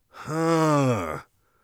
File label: sceptical, warrior voice; Warrior Voice Pack